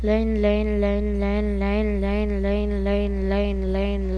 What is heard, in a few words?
rain